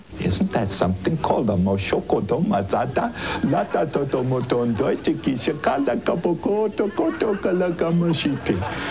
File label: tongue